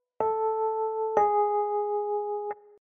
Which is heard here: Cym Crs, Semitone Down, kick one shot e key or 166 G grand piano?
Semitone Down